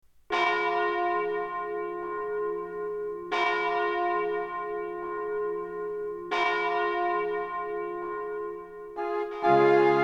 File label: Christmassounds; VIVA MUSICA Fachhandel